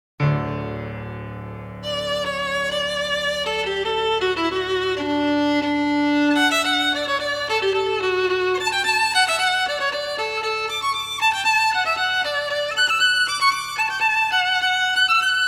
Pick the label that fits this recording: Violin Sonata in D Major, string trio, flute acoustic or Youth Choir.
Violin Sonata in D Major